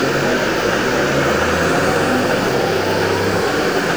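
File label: spell conjuration travel loop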